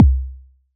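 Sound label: bass drum BASIK; ModelCycles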